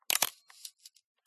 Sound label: Camera Film 35mm SLR Pentax K1000 Shutter Release 1 15th; MKH8040